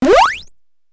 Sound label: randomize, 8 bits